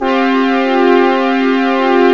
brass sus4

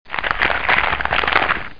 FIRES; AVX demo

